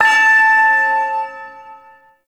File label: Shawzin Oct Two A; Warframe; Duviri Ersatz Shawzin